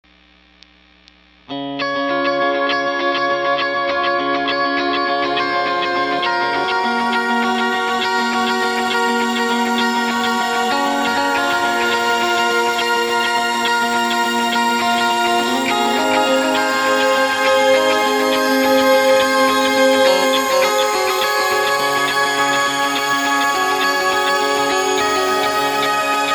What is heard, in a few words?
shimmer reverb